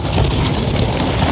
engine move; weapons; dota; creep

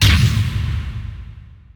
stun; visage

